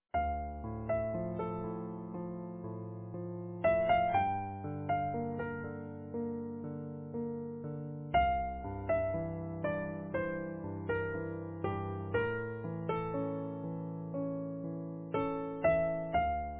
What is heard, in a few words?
Easy Piano